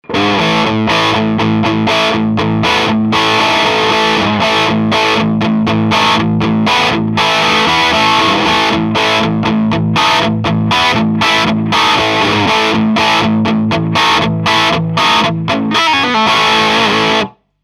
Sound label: crunch rhythm dry